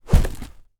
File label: Household Furniture Basket House; Sunflower Land